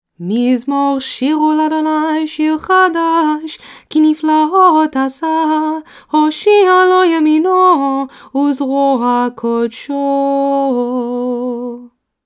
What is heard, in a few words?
Psalm 98 Opening Nusach; kabbalat shabbat